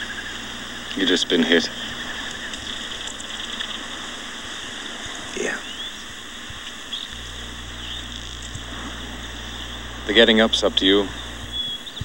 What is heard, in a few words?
hit; You've been hit